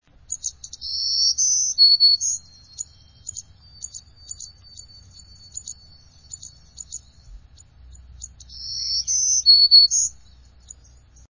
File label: Black throated Green Warbler